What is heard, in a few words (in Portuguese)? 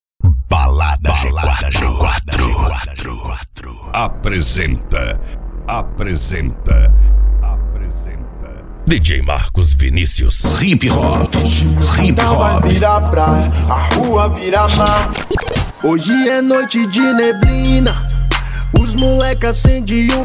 hip hop nacional e dale reggaeton; cd especial rosa volume 11